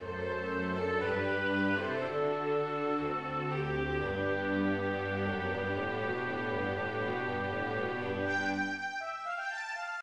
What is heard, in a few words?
Metric modulation; Bach